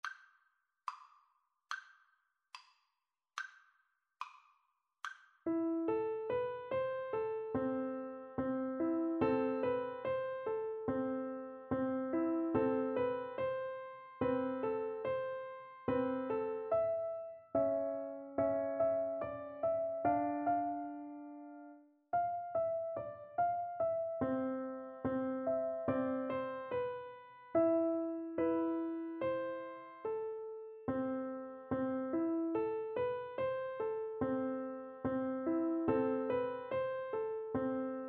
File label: piano duet